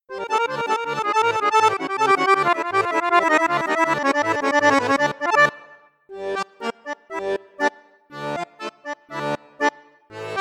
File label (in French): registre basson flute juste piccolo, accordéon; hohner morino artiste vi d